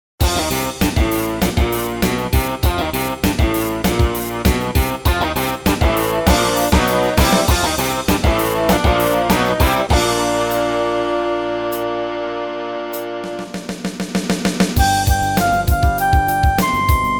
Hard rock

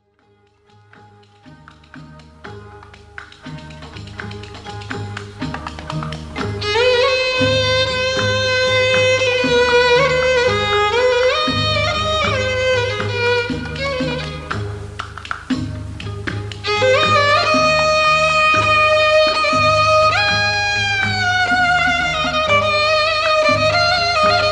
rebab; Sonora vol B

